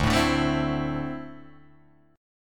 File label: D 7th Suspended 2nd, Guitar Open G